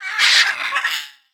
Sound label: Jeweled Macaw Play